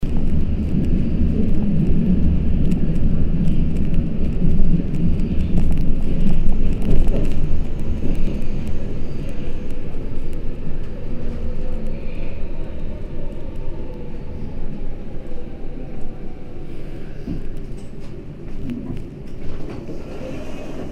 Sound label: subway arrival inside